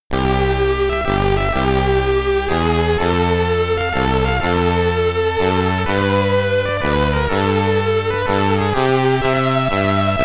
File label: SID Stereo, commodore 64; Auf Wiedersehen Monty